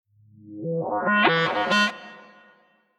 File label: 808 Slide